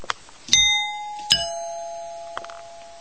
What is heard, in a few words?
doorbell